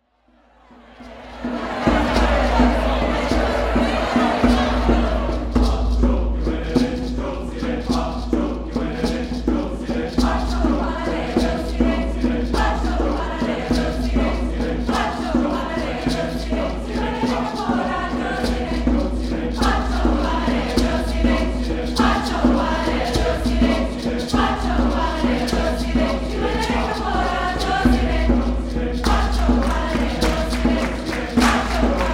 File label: Chorale Tres Cantos